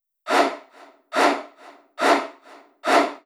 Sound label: 140 CHANT LOOP